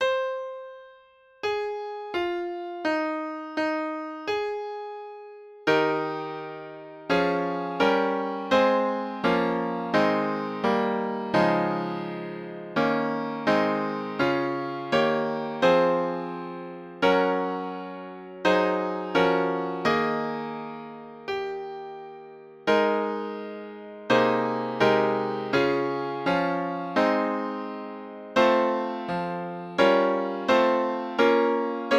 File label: piano; alma mater